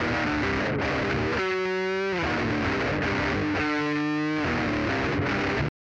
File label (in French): guitare satu